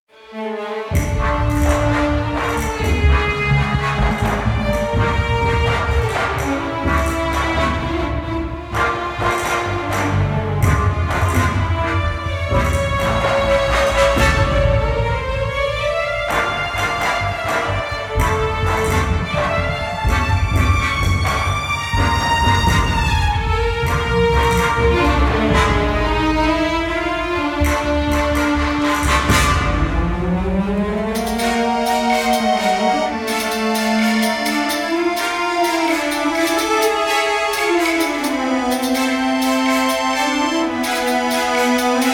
Combat Loop; Kazan

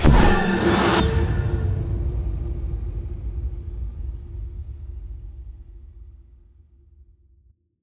Alienation

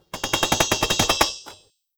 Pivot Long

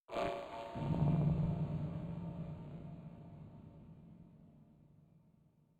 Music Hub Layer erosion disto; Music Hub Layer erosion disto 08

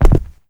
Land; Mars Sortie

